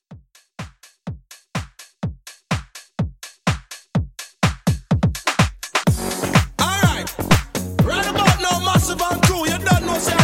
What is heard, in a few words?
House Mashup 125